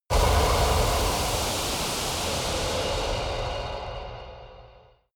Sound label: air pressure equalisation